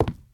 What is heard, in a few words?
wood walk; blocks; step; Minecraft; Remastered